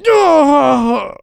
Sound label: pain severe; Civilian responses